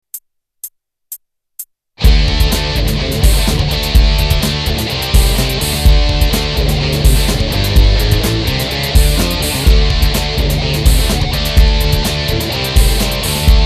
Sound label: jam